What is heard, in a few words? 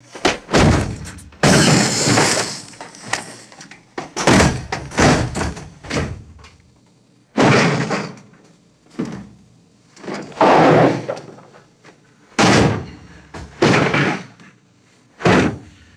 heavy crates dumped onto hard surface; destruction